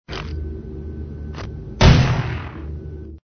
desert eagle

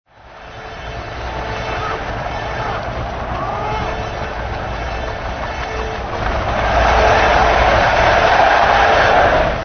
arena crowd

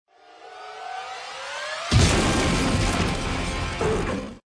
crash

